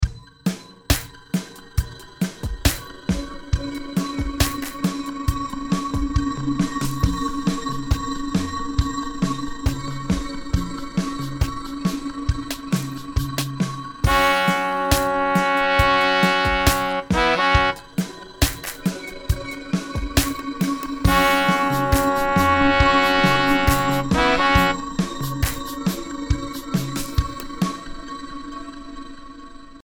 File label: Brass invasion